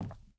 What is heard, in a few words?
footstep hide wood walk; Rust